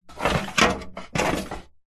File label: office plastic file drawer tray slide open or close